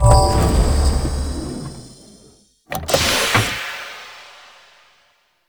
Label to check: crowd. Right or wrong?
Wrong